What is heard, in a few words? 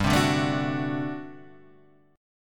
G Major 7th Suspended 2nd Suspended 4th G